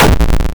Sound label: Explosion